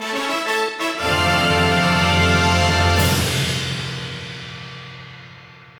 Goal Fanfare; crash bandicoot series sound box; Tire and Ice; 3rd Place

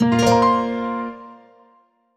Jingle Cup in Birdie; clubhouse games 51 worldwide classics 2020 switch gamerip

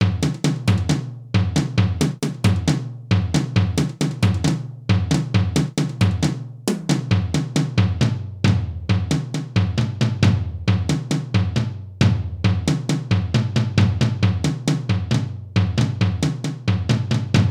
Mountain Beat

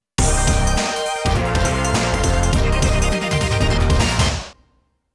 Round Clear

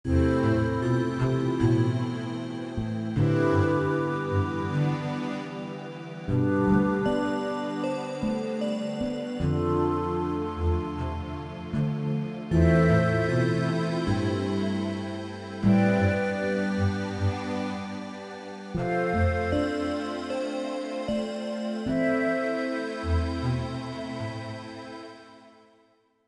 Background Music

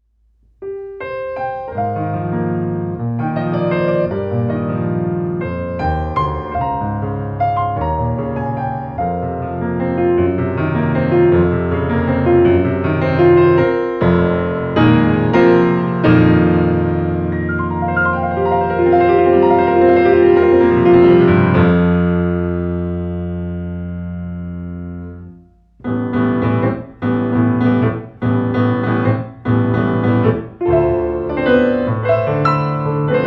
Piano Solo; megido 72 music box

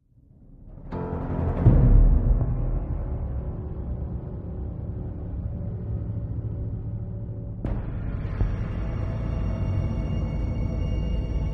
Loop Tense

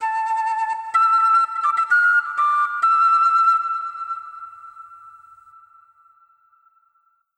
Music Quest Win; Fable Anniversary

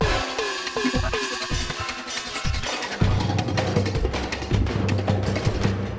Ascend; bolt 2008 pc gamerip

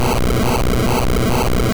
Fire Spin; Pokemon; Gen 1; attack moves; RBY

